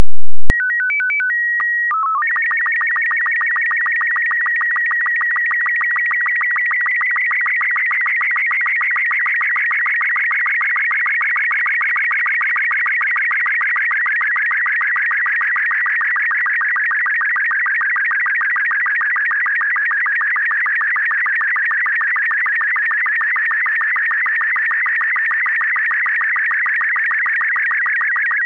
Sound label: Robot 36, SSTV